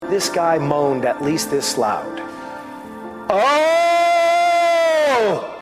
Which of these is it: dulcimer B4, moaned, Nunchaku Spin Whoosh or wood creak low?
moaned